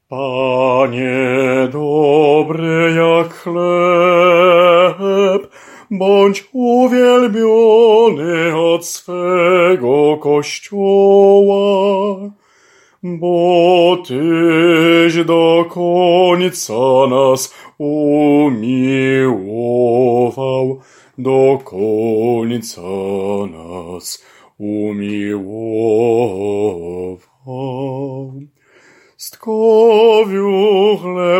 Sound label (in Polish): BAS; Panie dobry jak chleb